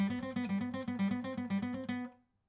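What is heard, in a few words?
acoustic pattern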